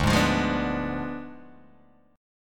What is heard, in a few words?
D Diminished 7th, D, down, Guitar Open G